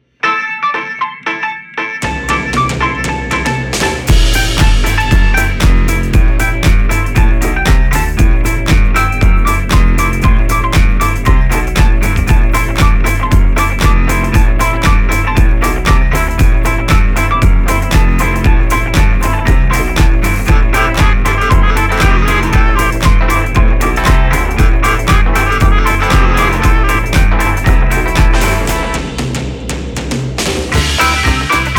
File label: Rough Mix, commercial music